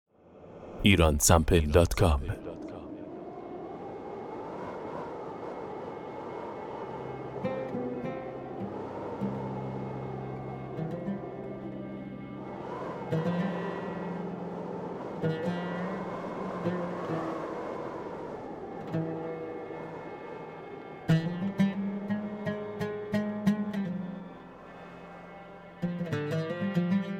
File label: wind; Iransample